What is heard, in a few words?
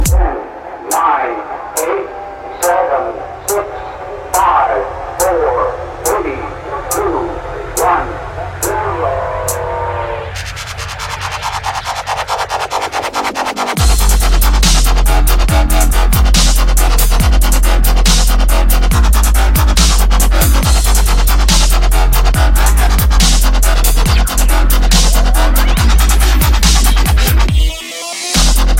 dubstep, ringtones